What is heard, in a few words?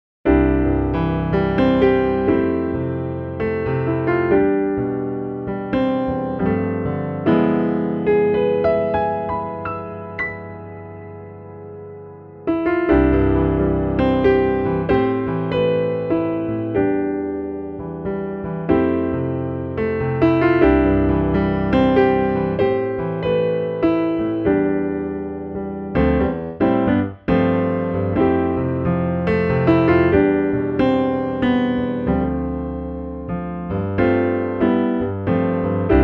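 Stein Ballad, Pianoteq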